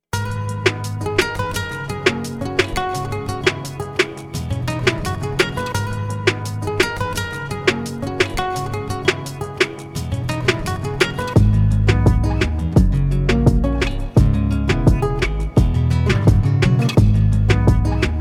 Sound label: Latin Beat